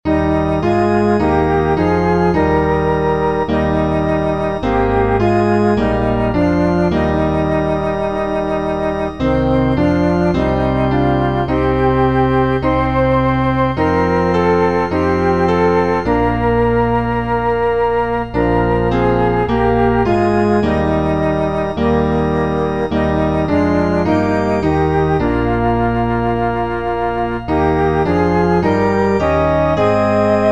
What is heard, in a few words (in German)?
Quartet; Blicke nur auf Jesum